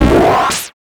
Multimedia Internet CD-Rom Flash Blast

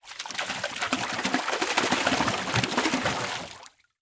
Liquid Water Water Feet Splash In Water Fast

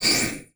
Multimedia Internet CD-Rom Flash Whoosh

